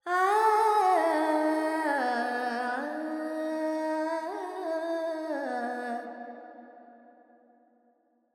Verb Phrygian, Vocals, Carnatic Female, Alaps; 70 G; Sample Banks

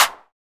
Clap, Percussion; Sample Banks

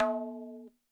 madalam notes L

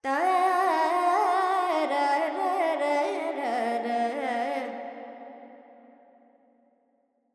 Verb Dorian, Vocals, Carnatic Female, Alaps, 70 G

